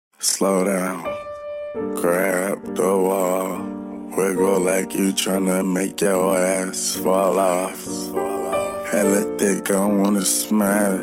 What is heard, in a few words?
sad pedal